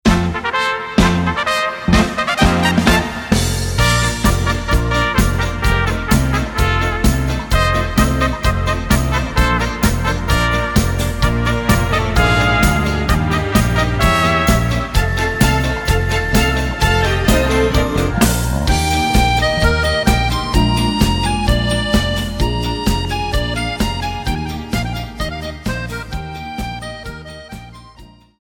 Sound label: Alpen March